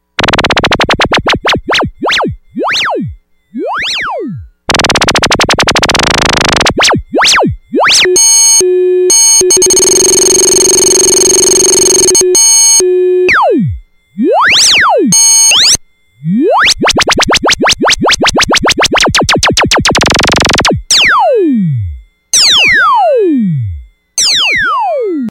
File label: lfo, synths